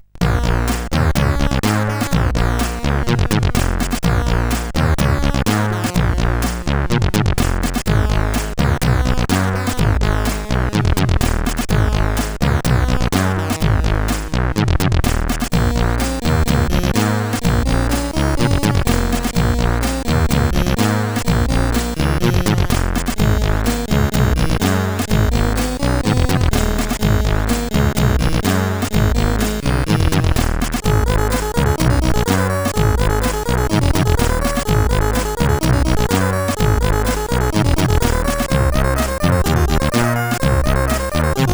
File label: Music Bass, sid CSG8580R5